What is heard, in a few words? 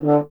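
French Horn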